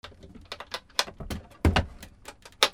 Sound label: BOATS